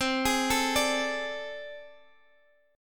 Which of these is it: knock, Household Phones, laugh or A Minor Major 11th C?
A Minor Major 11th C